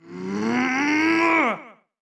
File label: shaman skill